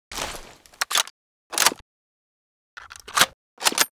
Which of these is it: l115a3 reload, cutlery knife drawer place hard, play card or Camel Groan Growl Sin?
l115a3 reload